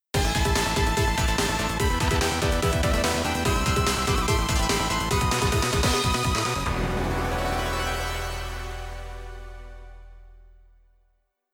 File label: Victory; Forsburn